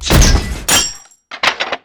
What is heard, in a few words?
Grenade Launcher Shot Eject; Weapons